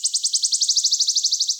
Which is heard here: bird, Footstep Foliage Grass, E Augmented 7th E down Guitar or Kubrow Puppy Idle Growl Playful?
bird